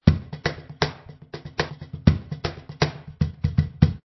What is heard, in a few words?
funky cajon